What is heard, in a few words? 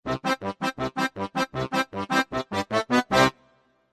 Music Logo Accordia, accordian